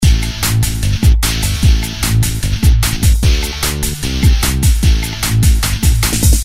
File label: rhythm electric guitar loop; Game loop rhytm; Sound Graph